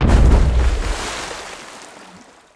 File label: jetski; giants